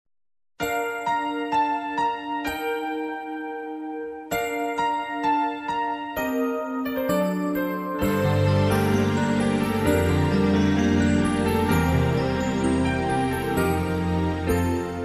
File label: bgm